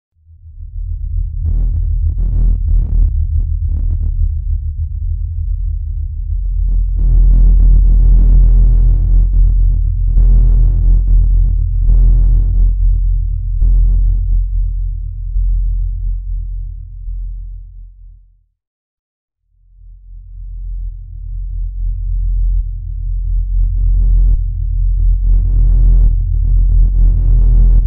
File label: Cinematic Pulsating Rumble